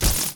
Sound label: move; Crypos; Karutan